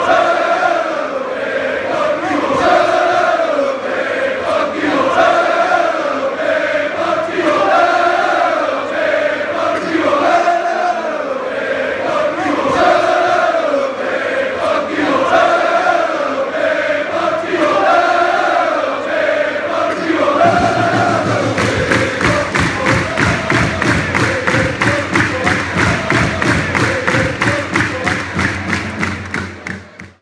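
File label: fanchants, football chants; Trueno Verde=Green Thunder=Club nickname; deportivo; spain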